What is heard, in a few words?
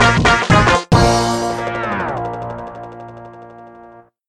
game over; tf2ware ultimate; music game; ds diy warioman